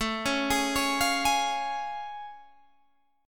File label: A Augmented Major 7th A Guitar Open G